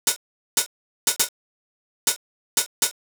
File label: ohh; hydrogen